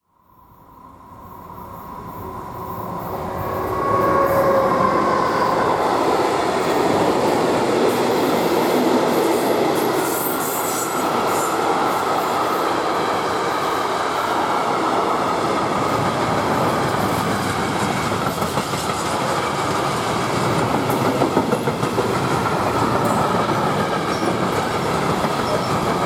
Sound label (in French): trains diesel et electriques; sonotheque